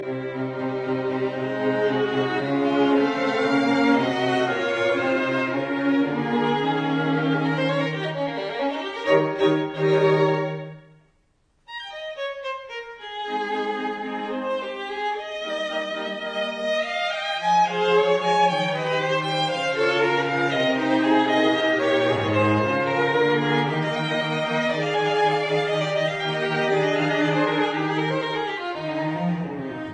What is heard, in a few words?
String Quartet in F minor, Moderato; Haydn; No 23; Op 20 No 5